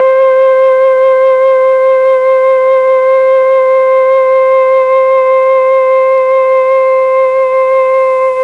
C3 TRON FLUTE, Mellotron; Mike Pinder - Mellotron Archive CD

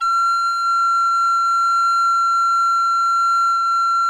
F6, kobliczek; SoundBytes - Early Patches

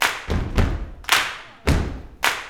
108 CLP FT, Vocal Blues & Soul CLAP FEET

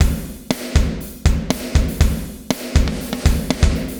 120 POWER, drums

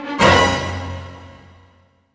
GRO, Orchestral Loops; 128 HITCHCOCK

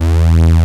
Taurus II, Moog Taurus; Greytsounds VOL-1

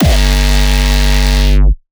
Hardstyle, kick

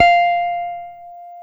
F 4 JAZZ GTR; Univers Sons; Jazzistic

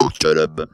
GET UP, Funky Vocals; USB Soundscan vol.18; AKAI

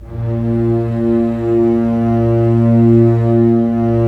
STR SEC, Roland LA Composer, Sec Mute St

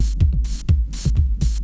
Bite Size Beats

